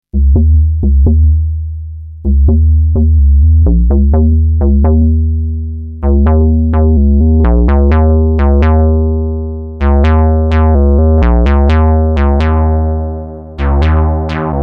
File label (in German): Bass das Klicken soll nicht mehr vorhanden sein